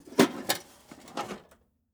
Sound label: toolbox lid open; prosoundfx